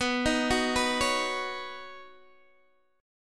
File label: B Major Add 9th, Guitar DADGAD chord; Badd9; arpeggiated; x,14,13,11,14,11